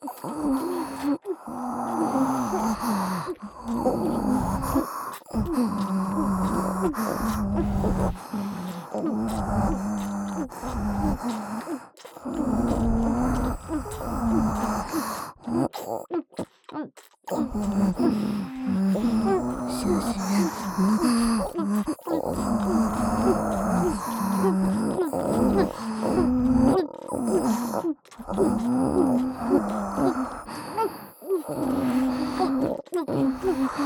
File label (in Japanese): イラマチオ＋耳元オホ喘ぎ; 3WAYループ; オナサポ